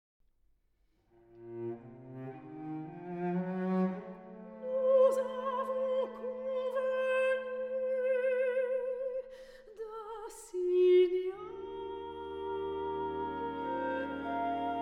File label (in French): le signal de la flute